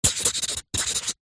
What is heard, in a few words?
pen sharpie sign signature; office